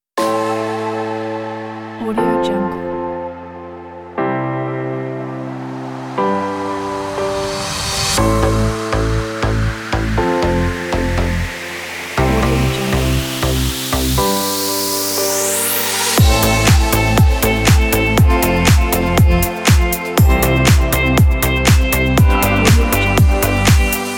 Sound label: deep house